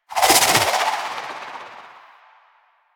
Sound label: Charged Proj; Weapons; Sidearm; Warframe; Tenno; Wraith Sidearm; Epitaph Prime